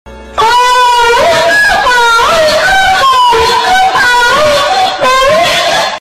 gemidao

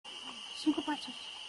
passos

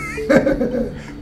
risada

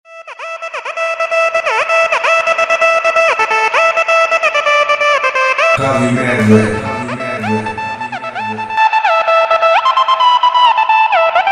medley vinheta radio medley

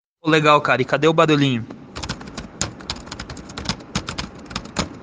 digitando

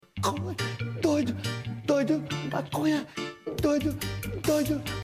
cantando um reggae; sikera junior

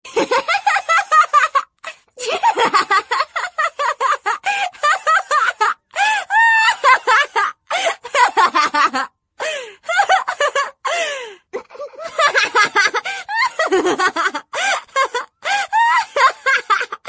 risada; jinx

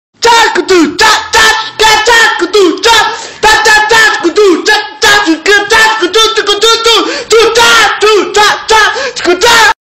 batida funk

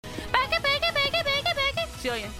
corneta

